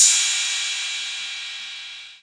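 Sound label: crash cymbal, 808 Basic drum machine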